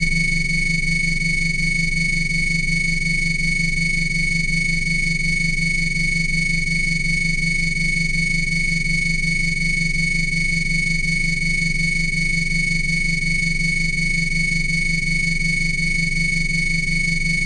Virtualengine; Suonho

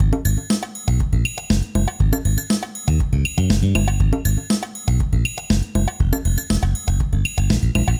Bass Line Theme Loop

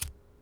Lighter

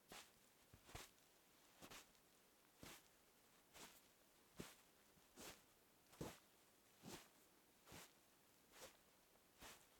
Soft Snow Footsteps

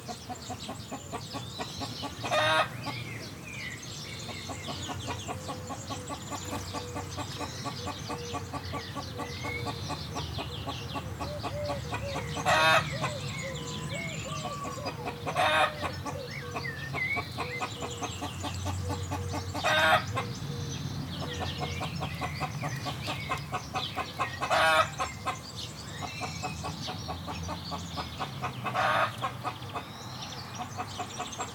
Hen

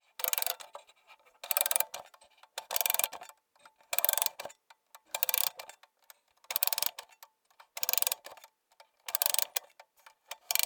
Mechanical Watch Wind The Clocks Ticking

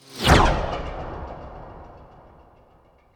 Ultrafast Vehicle Whoosh

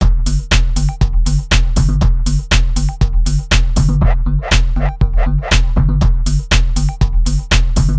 120bpm acid techno

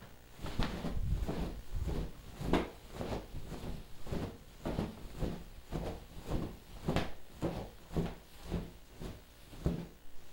Wings

